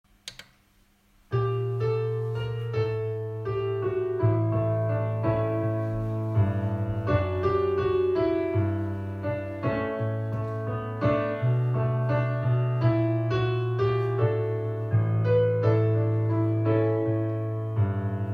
verden er våd og lys klaver

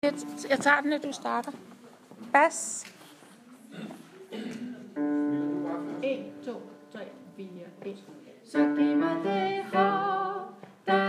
herrer

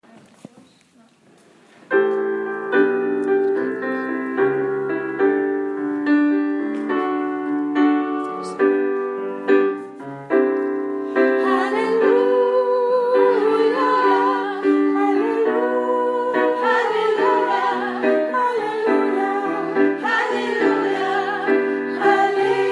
halleluja sopran alt

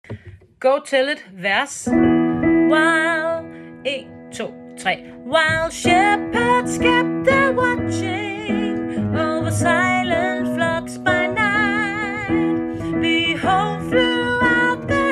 sopraner og basser; go tell it; vers

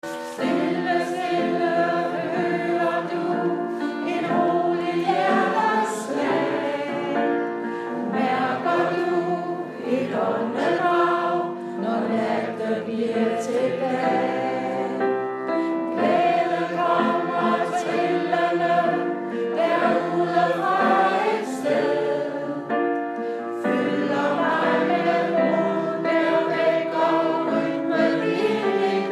unisont

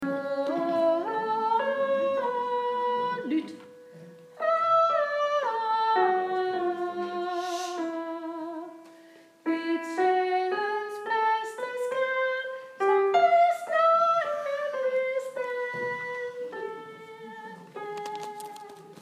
glæden sopran mellemstykke

